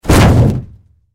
Impact Car Human Crash Land Hood Roof Metal Bang Hit; Blastwave